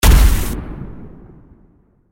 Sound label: cinematic hit explosion